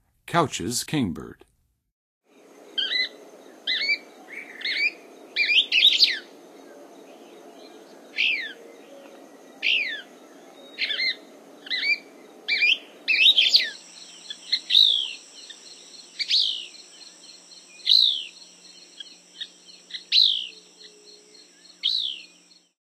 Couchs Kingbird, Songs of Birds of North America; Western USA; Western USA Songbirds

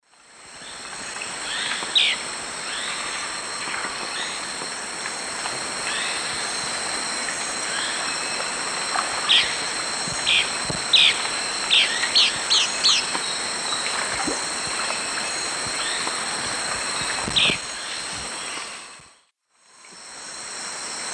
Conopias trivirgatus, calls; S. America; Eastern Lowlands

